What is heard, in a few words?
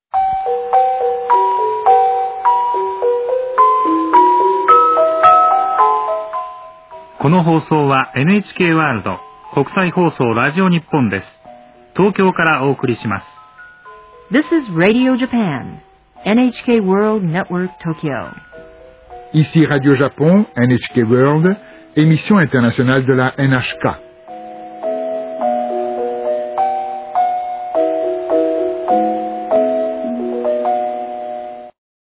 interval signal; radio japan